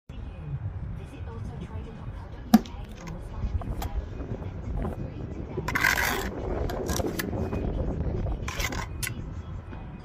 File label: Masonry trowel; کاردک فلزی